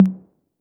Roland808 HT; Drums; Tr-808

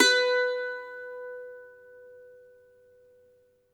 MANDOLIN, Plucked Strings

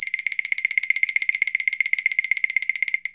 ROLL, PERC, Percussionworks; Sampleheads; NYC Percussionworks; 90 s Sample CDs

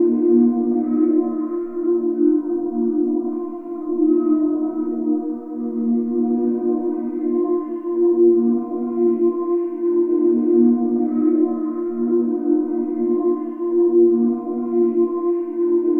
Spirit Flute; Sonic Foundry (Sony Creative Software); Ma Ja Le Ethereal Textures; Flutes

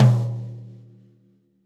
floor tom - snares on; JazzFunkKit

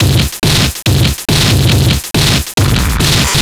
Noise Beat; Drums & Drones

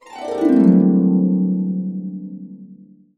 C, Harps; USB Soundscan vol.62 - Complete Harps; AKAI

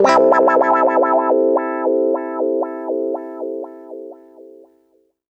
120 WAH PAD, Electric & Acoustic Guitar Loops

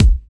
Kick, Kickdrum; Equipped Music; Loungin House

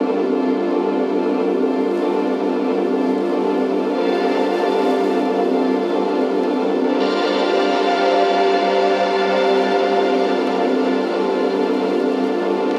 pad loop lovely 150 Gmin; Virtual Riot

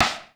PROC. DRUMS; Crypton - DrumBase5000

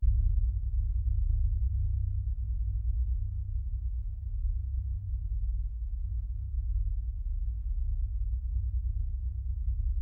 BD2, Percussion; Miroslav Vitous - Percussion & Harp

